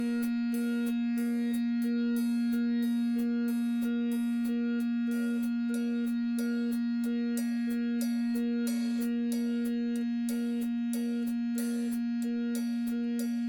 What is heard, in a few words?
screamy curtains, noise